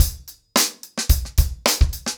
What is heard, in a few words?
110BPM, acoustic drums; The Roots Drumloops